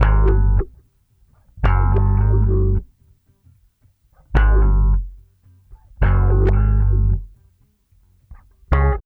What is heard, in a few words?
BASS, Funky Ass Loops; East West